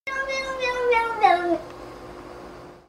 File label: NONONONO Cat